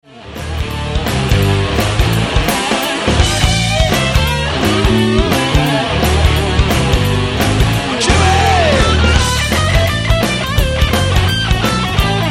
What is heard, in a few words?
raw blues